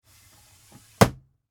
Ambulance Compartment Drawer Slide Shut; Emergency; Emergency Vehicle; SFX Bible

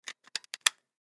Pager Insert Battery; Beep; Technology; Beeps; Multimedia; Blastwave

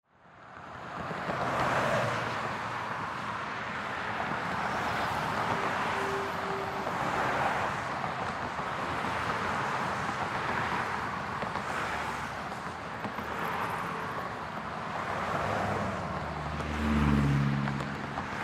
Traffic Street Intersection; Traffic Street Intersection Fast Pass Bys; Ambience; Traffic and Cars; Blastwave

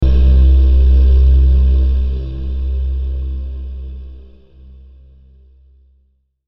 Logo Male Choir Deep Ahh; Sonic Logo; Blastwave

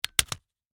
Hole Punch Paper, Office

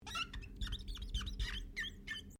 GLASS SQUEAK RUBBER SEQUENCE; Material components for constructing more complex sounds

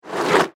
Alien Lizard Tongue; Alien Sound Effects; science fiction sound effect

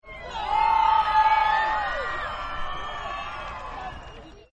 Rodeo Crowd Cheer; Rodeo Sound Effects

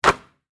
Basketball Net, Sports, Swish; Blast Wave

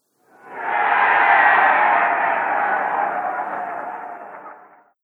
Distant Large Crowd Cheer Short; Andrew Fleming

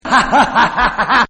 Big Weird Laugh; Laughter; Voice

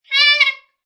Sax Laugh Quick, A saxophone quick laugh; Funny Musical (Sound Effects:Musical)

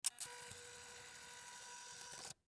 Camera Turn Off, Digital Olympus Camedia C720; Technology; Cameras; Electronics

